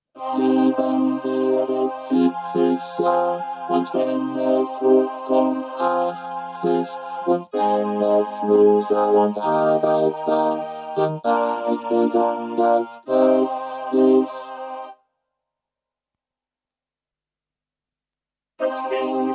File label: VC340 Vocoder; Das Leben waehret 70 Jahre